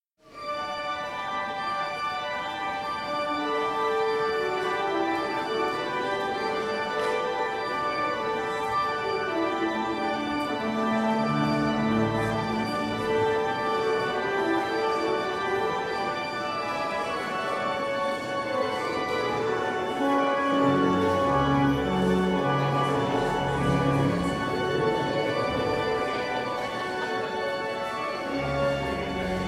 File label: carillon, voluntaries; Mulet - Carillon-Sortie; Organ Voluntaries